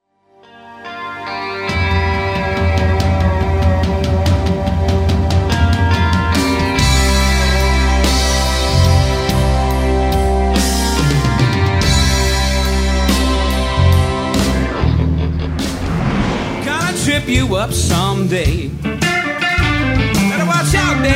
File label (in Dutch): Live muziek